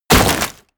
break wood small

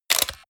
camera

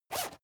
zipper use short quick

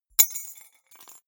key ring small drop stone hard

